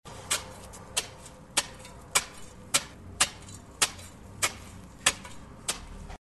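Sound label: sounds of shovel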